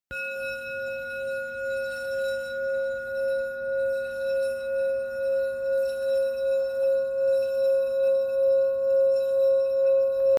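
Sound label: Yoga C, singing bowl; Flower of Life Purple Rounded Meditation Singing Bowl – 8″D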